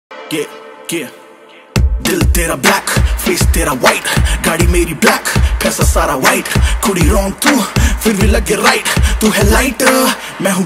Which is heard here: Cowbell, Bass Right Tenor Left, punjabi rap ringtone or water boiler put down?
punjabi rap ringtone